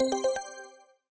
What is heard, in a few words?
Buy potion